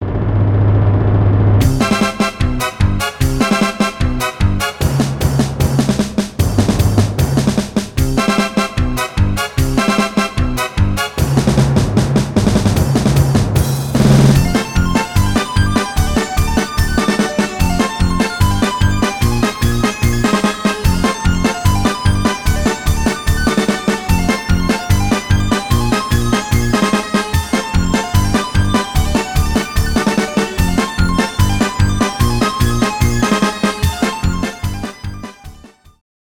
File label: Battle Victory; bomberman remake; bomberman 94 remake